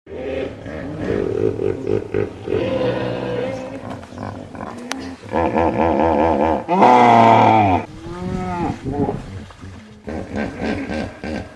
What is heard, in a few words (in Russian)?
zvuki begemota